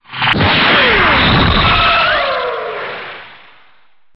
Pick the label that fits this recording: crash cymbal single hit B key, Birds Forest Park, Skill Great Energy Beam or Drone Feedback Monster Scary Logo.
Skill Great Energy Beam